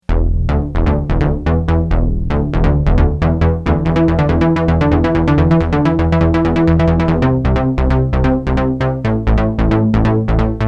BASS The Industry

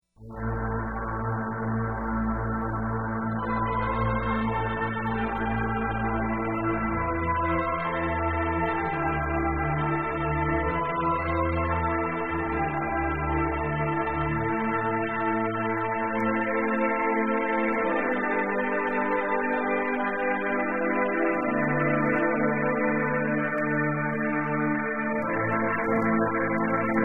Taped Strings

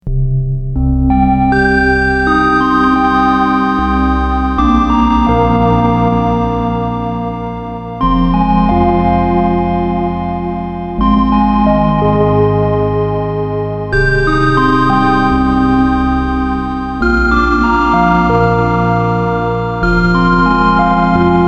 Gimme A Sine

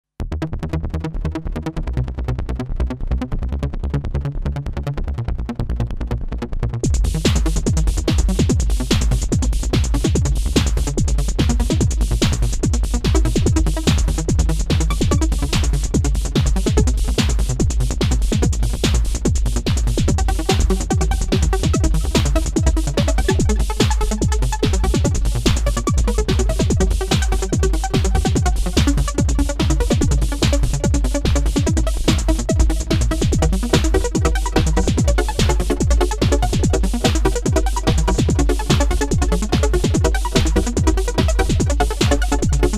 Trance Song

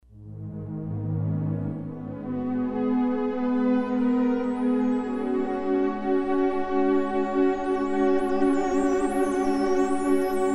Glimmer Pad; Kris Rhen Presets

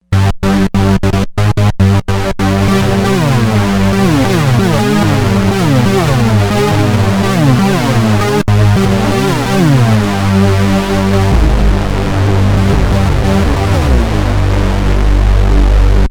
Hoover; Hooverville

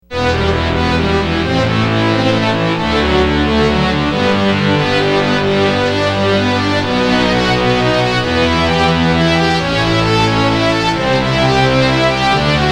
STRINGS Mello Cello

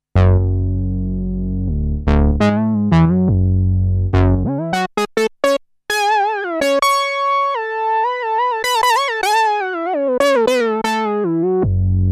Mini Lead

